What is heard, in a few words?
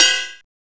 SHRT CYM; Zero-G; Funky Elements